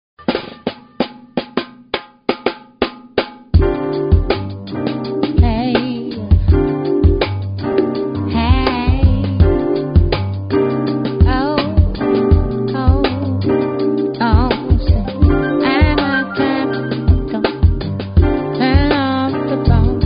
funk; multitrax

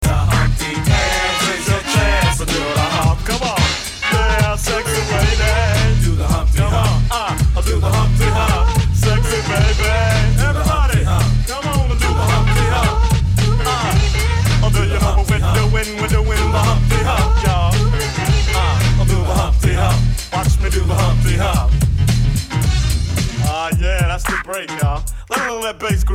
funk; multitrax

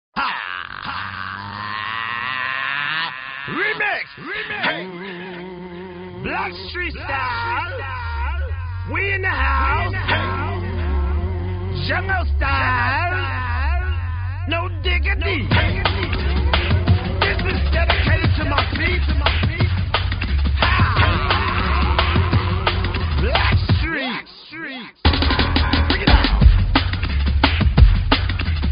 funk; multitrax

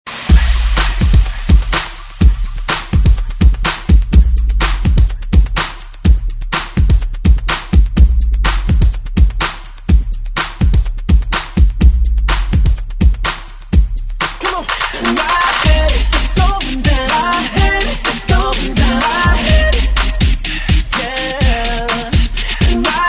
funk; multitrax

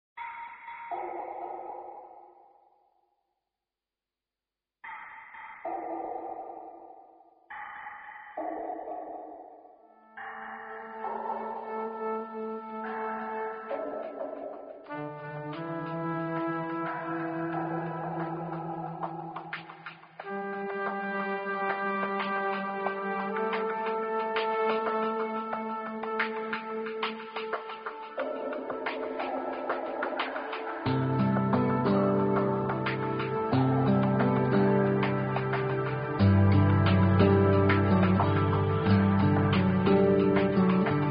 kick; multitrax

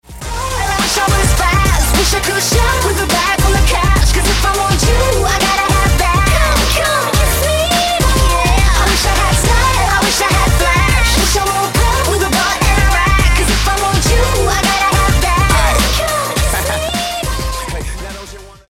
funk; multitrax

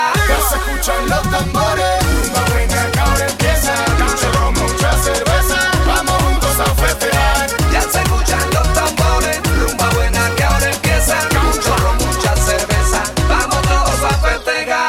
latin explosion; multitrax

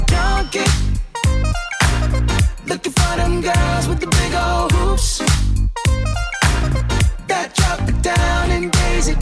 funk; multitrax

